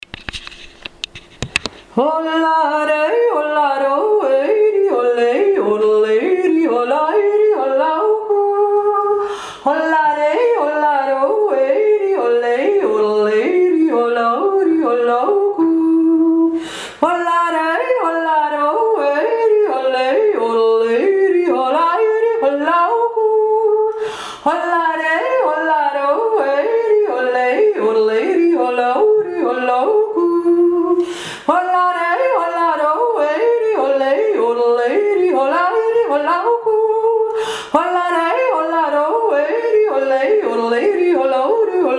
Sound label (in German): jodel weise